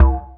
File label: DM BAS, Dance Mega Synth; Best Service